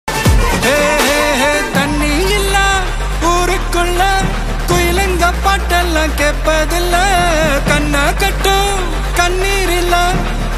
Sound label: ringtone bgm ringtone; goat